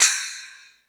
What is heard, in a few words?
Crash, Drum Machines E-MU Pro-Cussion